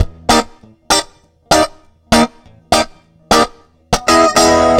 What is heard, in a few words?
Off Guit, лупы